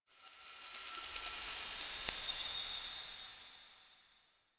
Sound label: Metal Whispers; Warframe; Quests; TheNewWar; Ambience; Zariman